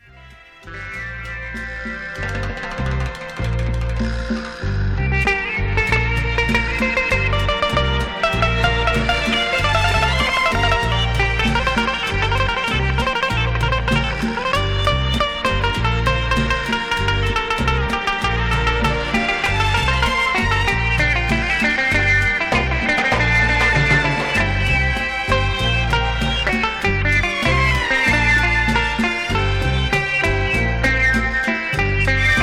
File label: electric sitar